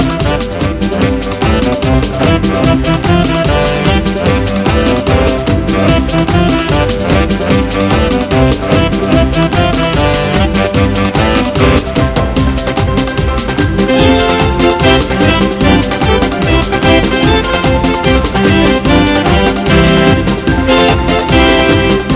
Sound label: A Hot Merengue